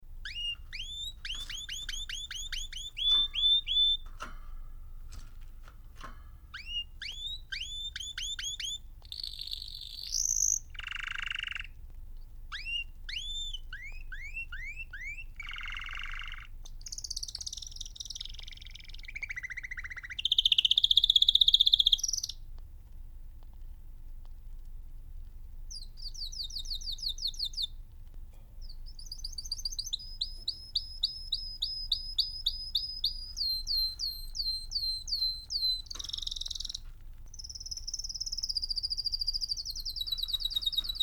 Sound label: Parakeet Audio